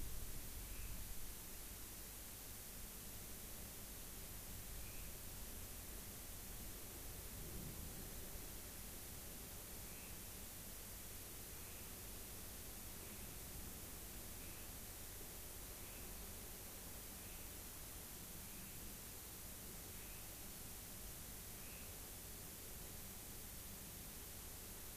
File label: rayol farm; SEAMED